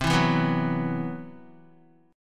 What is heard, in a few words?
C Major 7th Flat 5th down Guitar; CM7b5 strummed; JGuitar